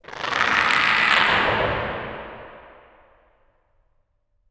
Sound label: Timid; moul; Bahro